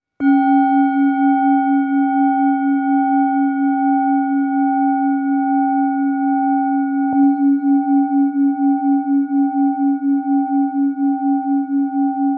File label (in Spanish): Do sol, cuencos tibetanos